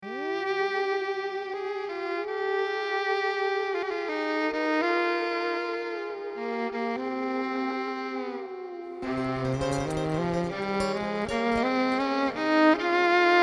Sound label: Space Waltz Jam; ClassroomGrooves